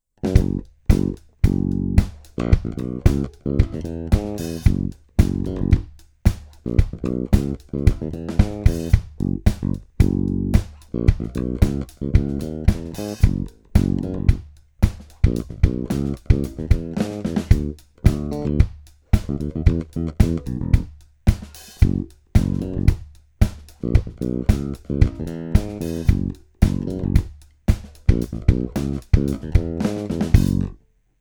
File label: Shortscale J Funk, basses